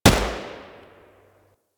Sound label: Shot; Enemies; Warframe; Eidolon; Jetpack Marine; Grakata